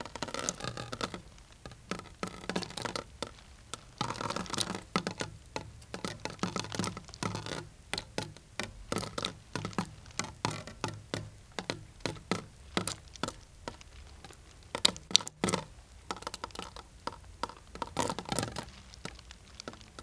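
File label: WATER DRIP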